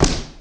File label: attack melee